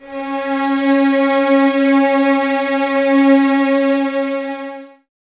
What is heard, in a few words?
Violins Exprsive, String Ensembles; Miroslav Vitous; 11 Violins